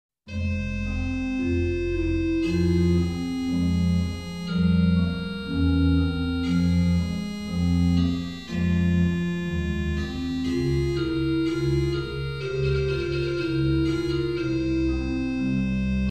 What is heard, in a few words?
organ